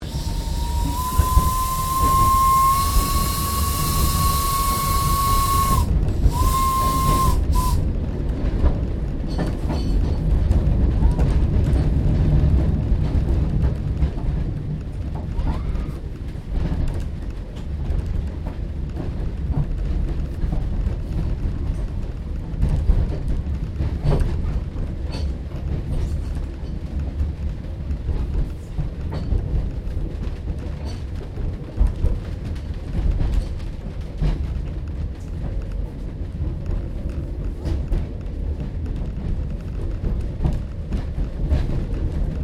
Train Steam Locamotive Kraus 0-2-0 Carriage Interior; Kraus No5682; 1906